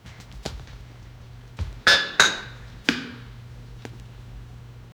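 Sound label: footsteps creaking distant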